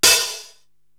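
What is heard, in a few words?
HH Chunk Open, hi hat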